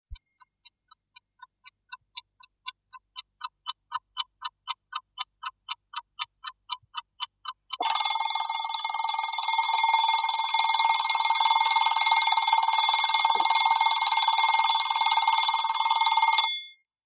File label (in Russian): budilnik